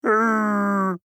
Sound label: bear howl; open toontown resources; dial